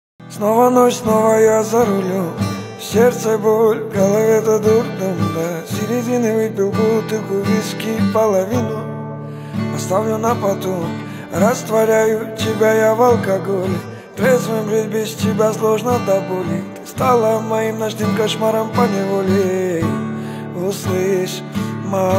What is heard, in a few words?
Acoustic version